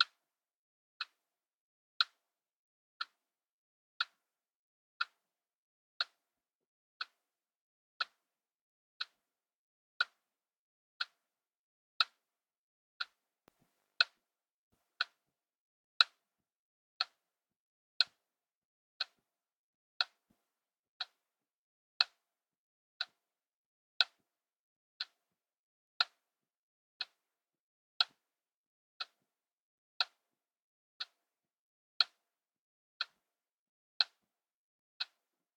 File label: watch; devices accessories utilities; NS Studios - ultra sound collection